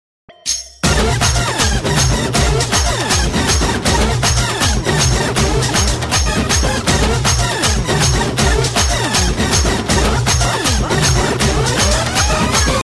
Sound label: Indian Mix